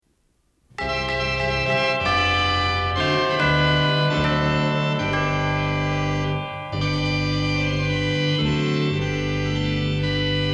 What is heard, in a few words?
Christmas Organ & Chimes; Deck The Halls